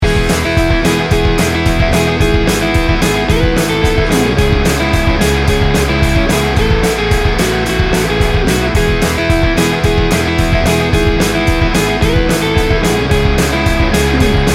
fast power pop rock

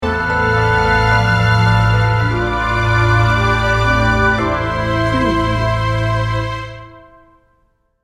cinematic heartwarming dramatic sting bumper

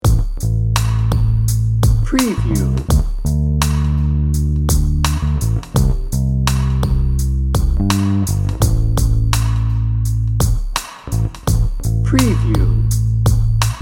slinky soul dnb

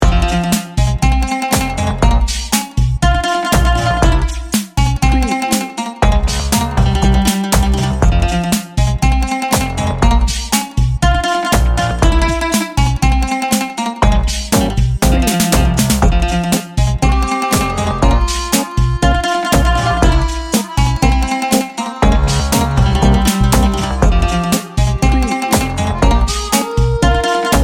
tango passion

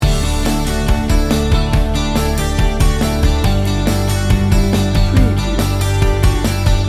win power loop

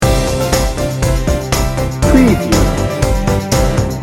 deck the halls loop

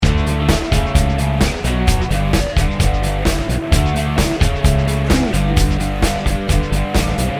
upbeat soft rock loop

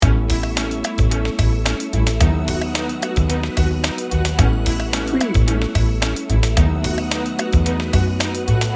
cool comfort loop

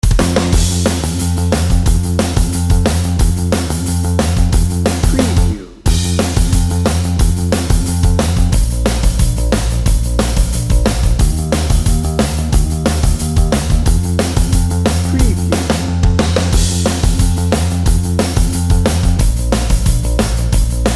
pop rock party dnb

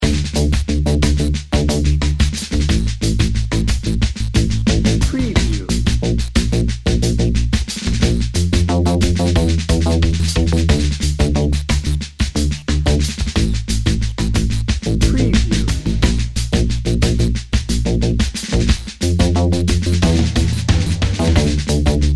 somber reflections dnb

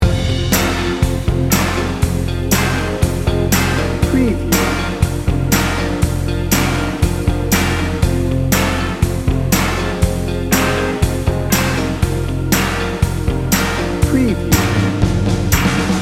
detroit soul loop

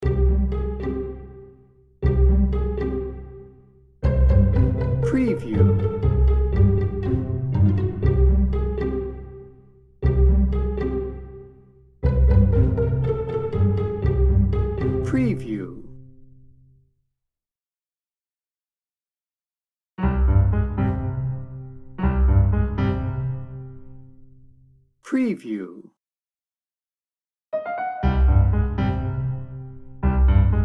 mischief makers strings piano

